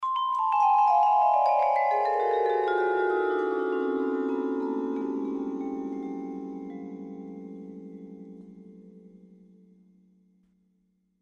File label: Vibraphone Magical D; mallets vibraphone